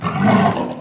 knight of windstorm damage

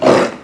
were wolf damage

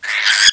hornet damage

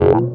attack; skeggiold

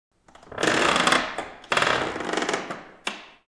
creak

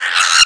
renire damage

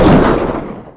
trample

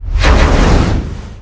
triangle

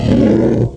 airboat tree damage

